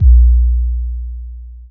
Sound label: bd boom